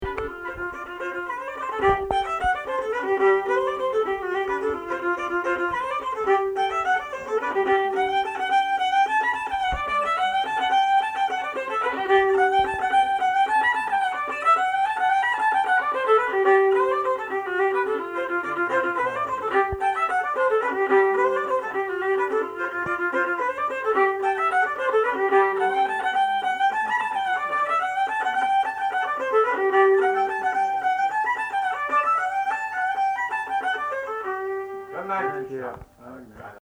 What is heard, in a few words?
fiddle